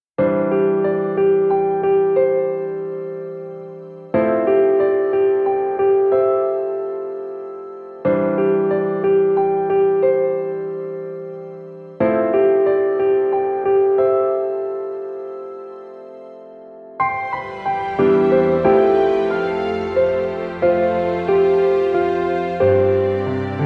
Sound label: Yakusoku piano; Sukima Zakura to Uso no Machi; Sakurano